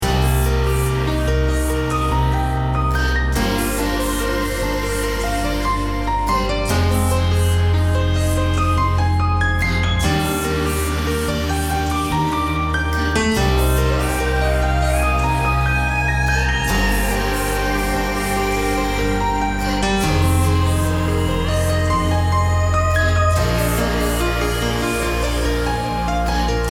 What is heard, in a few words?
Healing Melody